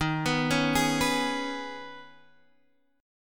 Eb 7th Suspended 4th Sharp 5th, Guitar, Half Step Down; Eb7sus4#5